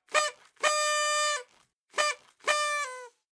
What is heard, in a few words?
Party horn